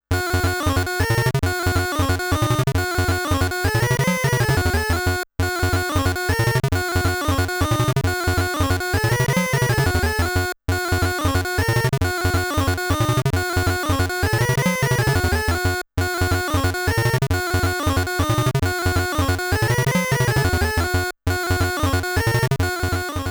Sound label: Cofee Break MUSIC; Namco Classic Collection Vol.2; arcade